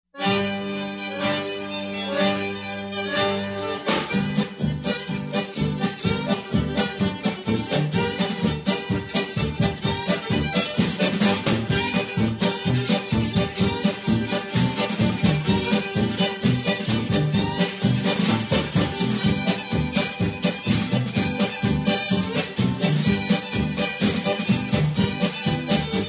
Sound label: possom sop, Instrumental TRacks, square dance music